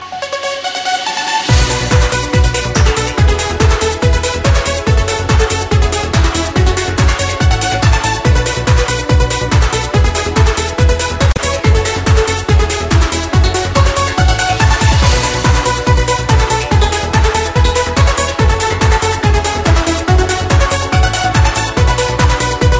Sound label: techno remix